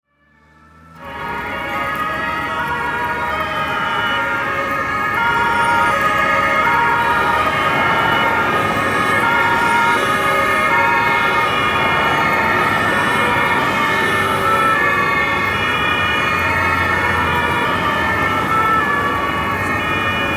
Polizei Grosseinsatz

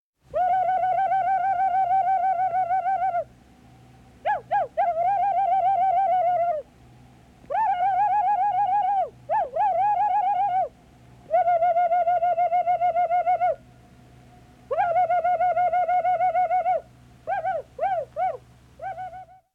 Kampfszenen Kriegsgeheul Von Indianern

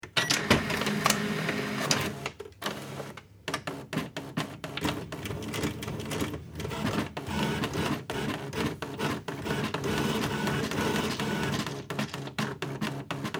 office fax

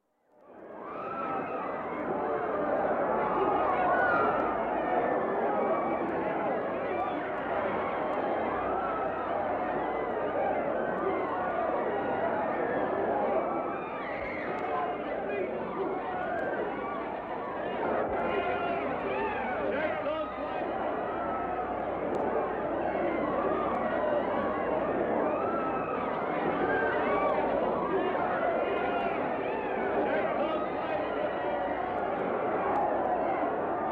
Grossstadtgeraeusche Menschenmenge In Panik

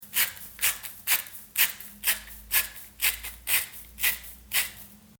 Salzmuehle

